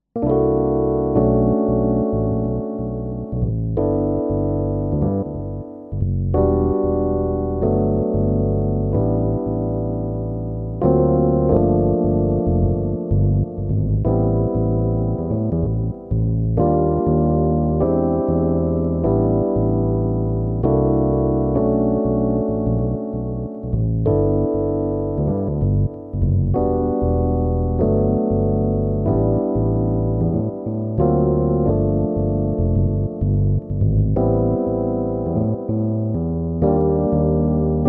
rhodes

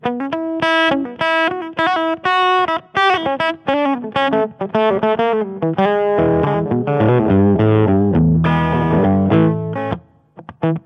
fa100 ts808 more gain; philips novosonic

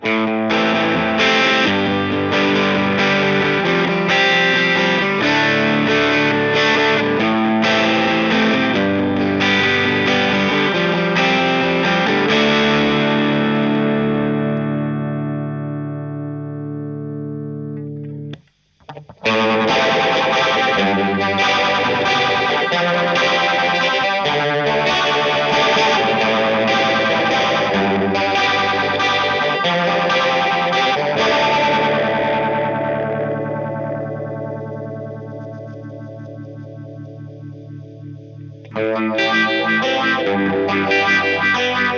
strat bridge od, phaser; coron phaser55

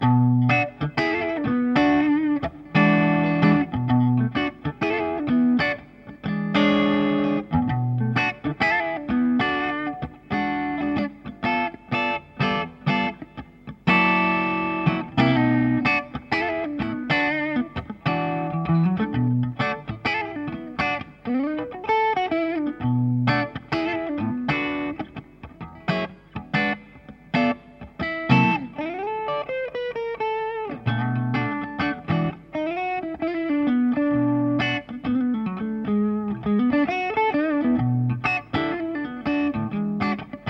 strato blender

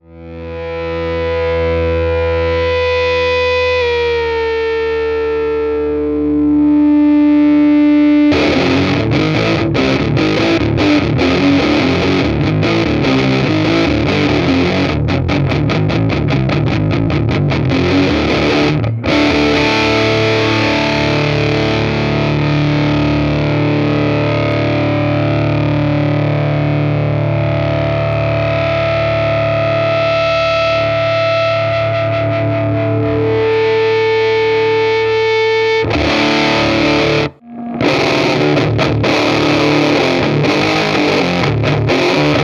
channel1 boost lp tuhti

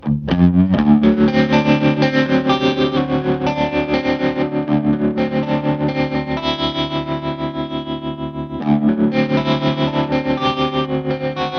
strato blender sparkledrive tremolo

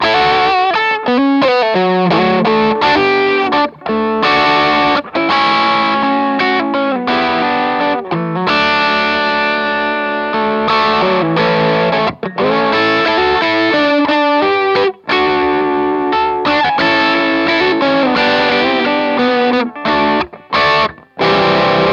vibrato dirty; mojovibe

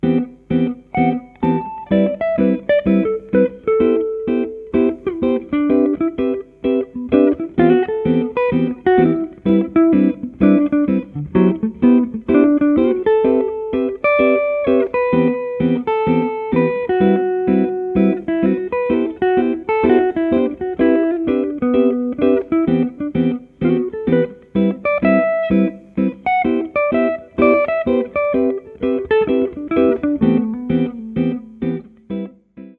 d maj pentatonic on c maj7

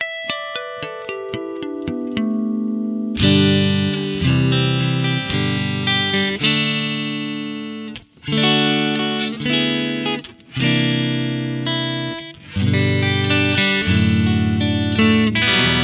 clean strato kaula; v-twin

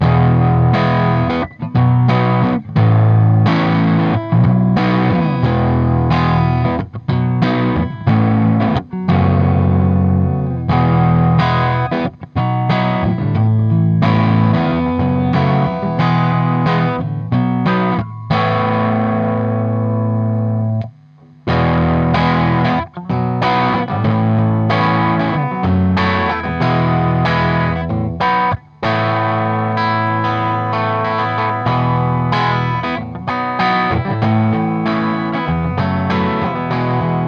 electro-harmonix el34; paateasteputkivertailu

